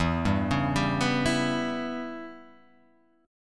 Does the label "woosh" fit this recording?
No